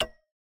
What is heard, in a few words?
Ui wrong